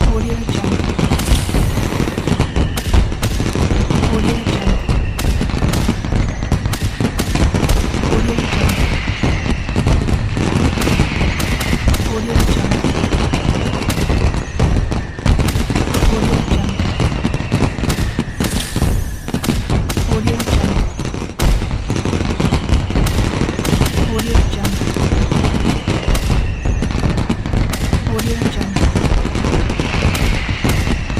fireworks

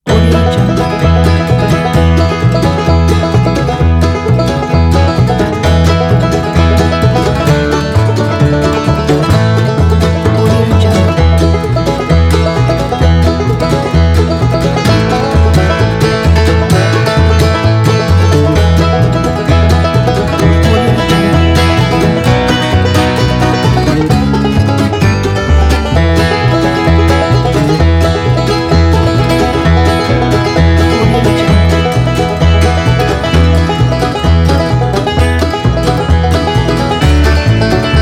country upbeat banjo

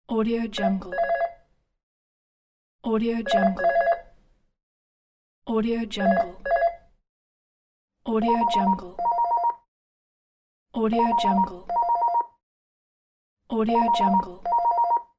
landline phone ringing

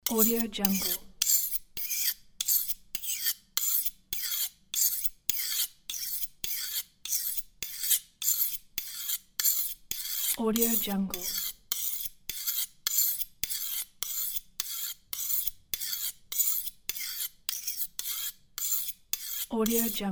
knife sharpening

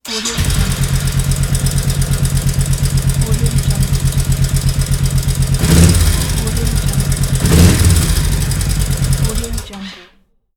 the motorcycle start

